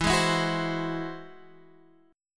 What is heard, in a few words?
G sharp Augmented 7th E